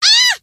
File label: fear, scientist female